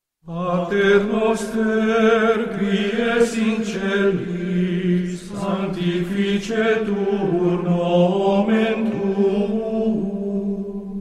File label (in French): PATER NOSTER – La priere du Notre Pere chantee en latin; avec traduction FR